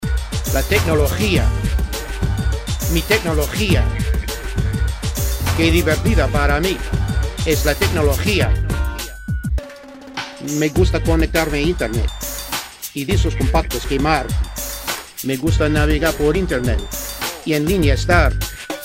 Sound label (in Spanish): que divertida es la tecnologia